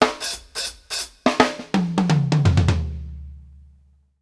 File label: HAT L, Drumworks FILLS 84; Sampleheads; New York City Drumworks VOL-1; KD FILLS 84